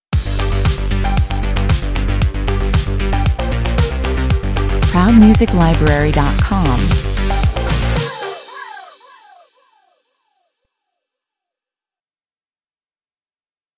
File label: stinger